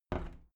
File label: window plastic squeak open soft